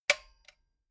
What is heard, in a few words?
metal button stereo spring click medium